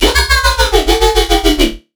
Riddim Bass Shot 140; Dubstep; Riddim Basslines; FMRI; Ghosthack; Advent Calendar 2019 Day 17; Riddim Bass Shots

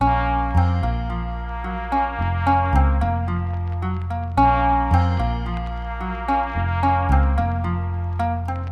Melody Loop 110 BPM A# Min; Melodics; Melody Loops; Cymatics; Cobra Hip Hop Sample Pack

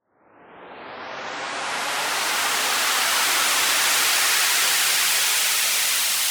Dubstep White Noise Up 150 BPM; FX; Cymatics; Dubstep Starter Pack

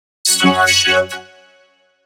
Vocal 140 (F#); One-Shots; Future Vocals; Starship; Ghosthack; Color Bass Essentials

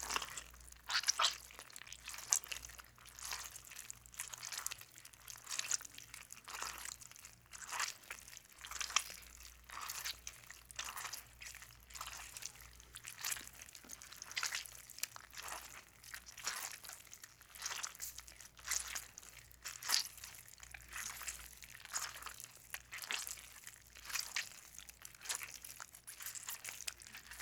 Blood Splurging and Gurgle; Fairlight Sound Lib

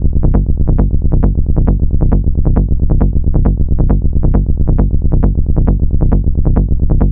Bass Loop B 135, Techno; Ghosthack; AC2024 - Day 1 - Techno Sounds 2025

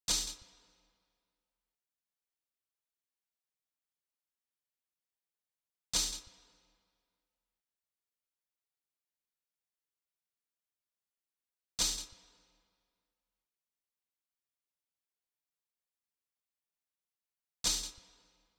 Knock Drum Loop 82 BPM Open Hihat; Drum Loops; Loop Stems; Hallway; Cymatics; Mystery Pack Vol 4

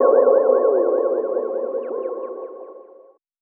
FX UFO

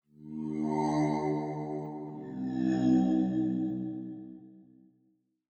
Pad; Dubstep

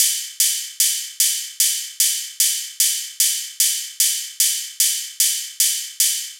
Hardstyle Kit G#m 150bpm Ride, Drums; EDM Drums; Drum Kits; Stems; Ghosthack; Ultimate EDM Drums; Drum Mega Bundle; Hardstyle Kit 08

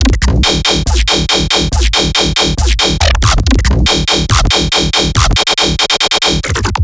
Riddim Bass Loop 140 D#; Dubstep; Riddim Basslines; Apex; Ghosthack; Advent Calendar 2019 Day 17; Riddim Bass Loops

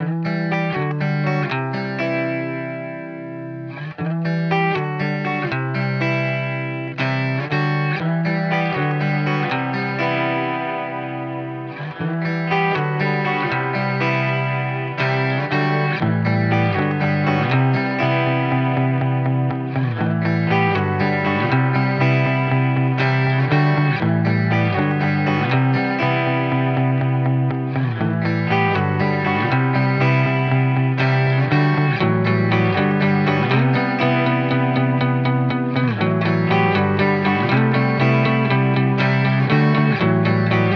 120 BPM E Min, Guitar; Missed Calls; Cymatics

